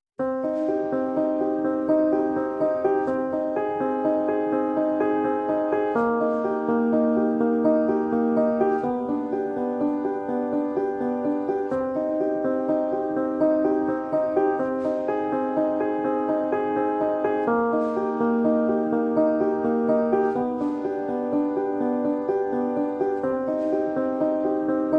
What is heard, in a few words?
Piano Only Mix